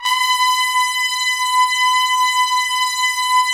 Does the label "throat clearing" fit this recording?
No